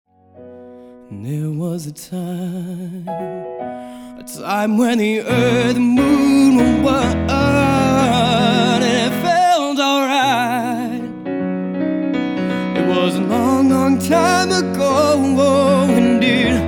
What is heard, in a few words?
X12 Male Vocals